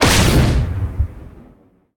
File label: Ship Hit